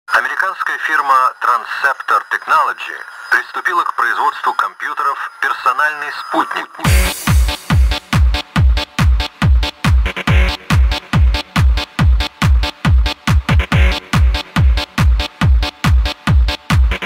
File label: trance mix